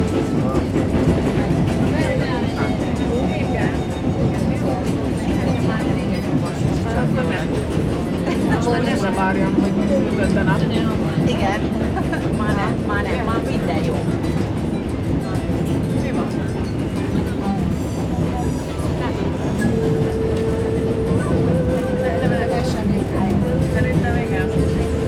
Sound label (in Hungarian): panoramavonat tovabb